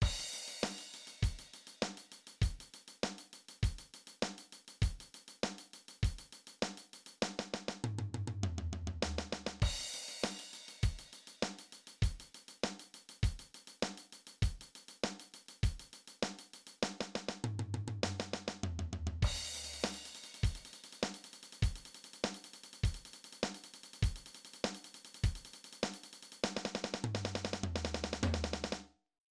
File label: Paradiddles, drumset; Beginner; Drumset Exercises